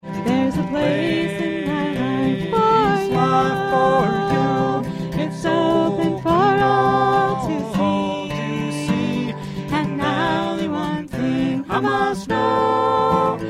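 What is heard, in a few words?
acoustic string band, recorded live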